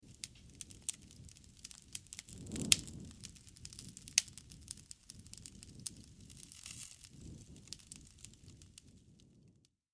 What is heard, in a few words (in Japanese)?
焚き火 長